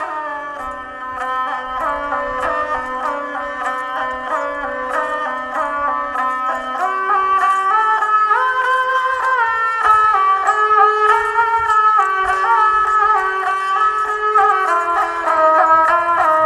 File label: A Man Approaches with Bowed Sitar Rishikesh; Samuel Corwin; Selected Field Recordings from India and Nepal Volume I Folk Songs